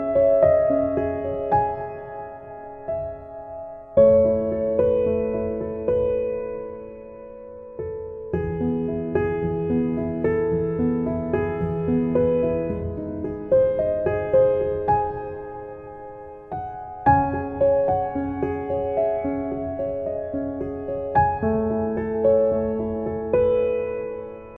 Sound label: beautiful sad piano instrumental ringtone